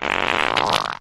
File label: fart medium